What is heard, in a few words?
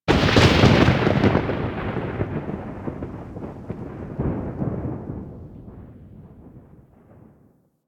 Plains Thunder Clap; Ambience; One Offs; Warframe; Eidolon Plains